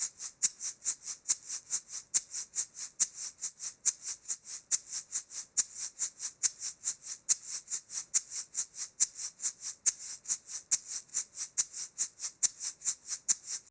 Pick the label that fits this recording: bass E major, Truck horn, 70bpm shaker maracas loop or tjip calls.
70bpm shaker maracas loop